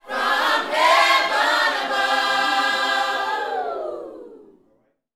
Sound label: Vocal Gospel PHRASE